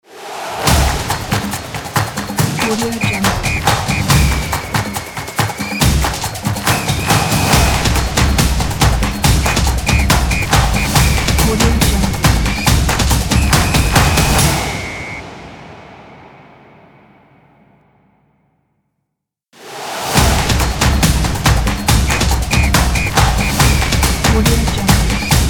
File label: soccer drums intro logo